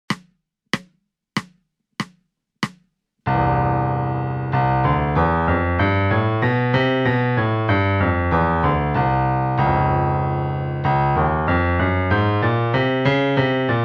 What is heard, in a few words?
one octave major scale 95 bpm C2